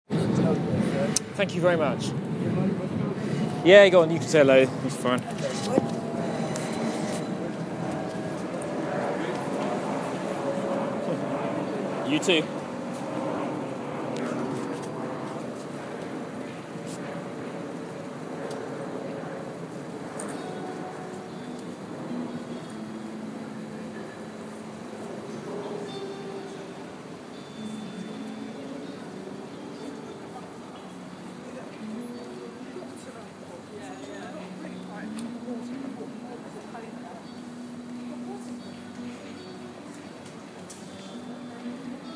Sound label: distant atmospheric electric guitar busking; in london in may 2014